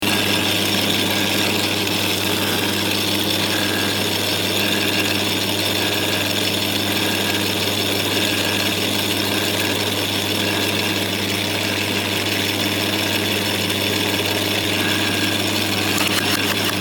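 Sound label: sadovaia shepkorezka, garden wood chipper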